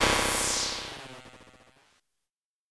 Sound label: Lazer Hit